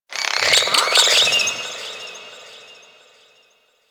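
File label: Settlement distant Insect; Ambience; Warframe; Grineer Settlement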